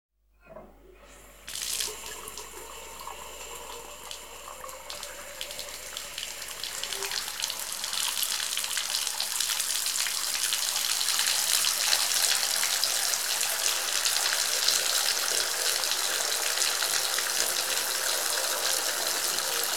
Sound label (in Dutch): Bad Loopt Vol; KMP Film Video Sound Effects